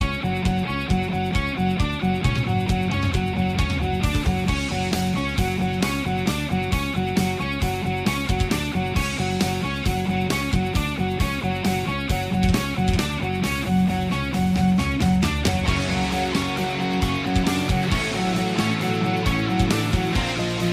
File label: halloween rock